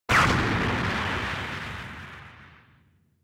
Explosion Long

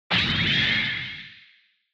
Retro Anime Robot High

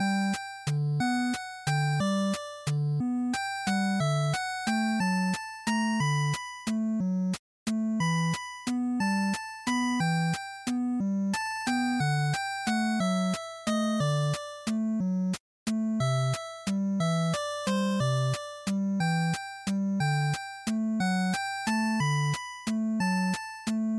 NES Action Stage3 Loop60

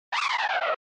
SNES Racing Slip

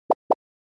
Anime Motion Low

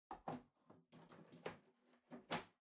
Air Purifier Far Panel Close

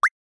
Motion Pop

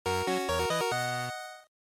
Victory, Short; GB RPG; bgm

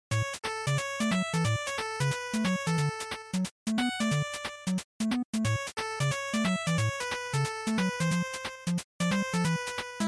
NES Racing Title Fade Out

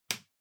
Power Strip Floor Switch

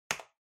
Trackpad Click, Far R Hard

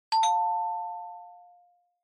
Quiz Ding Dong Single

